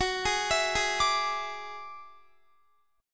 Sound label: F sharp 7th Suspended 2nd Sharp 5th, Guitar; F#7sus2#5 arpeggiated